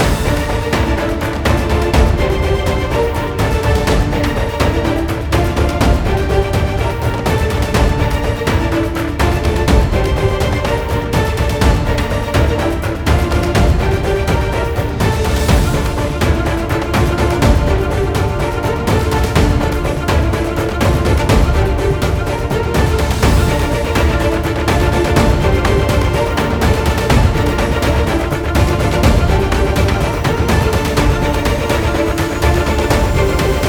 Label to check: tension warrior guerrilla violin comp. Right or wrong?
Right